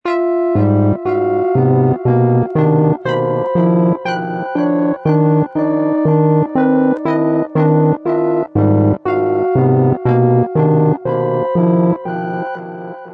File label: High n Creepy, high-pitched and creepy background sound; High quality stereo high-pitched and creepy background sound excellent for evoking fright and fear, perfect for horror-thriller genre; Scary Tone